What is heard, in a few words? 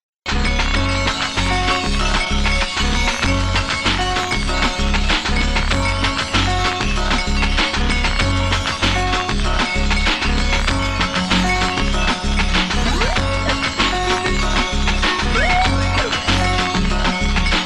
Melody, RingTune